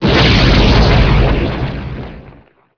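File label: explosion gas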